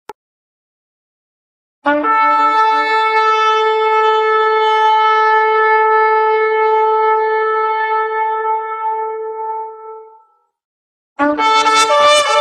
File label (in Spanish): Shofar Audio del instrumento